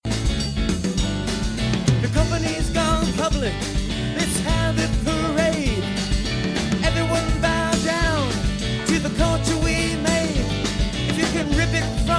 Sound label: Insiders Blues